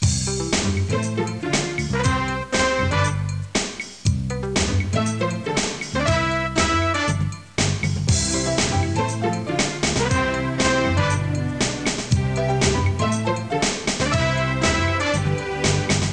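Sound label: Background Music; Let's Discover Massachusetts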